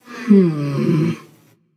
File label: thinking passion; chaac